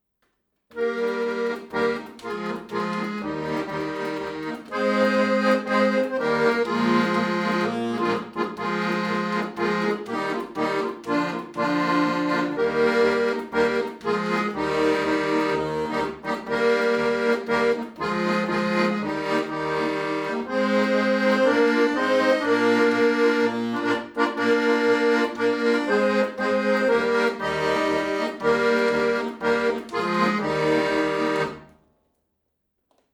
accord ortf 2x2.5m schoeps; Test mikrofon

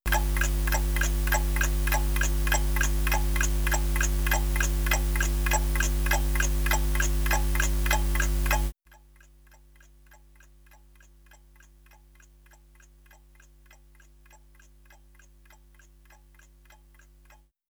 shure sm57 noise; Test mikrofon

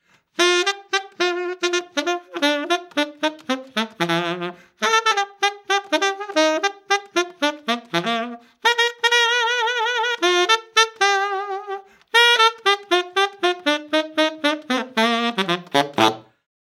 sax sennheiser md421; Test mikrofon

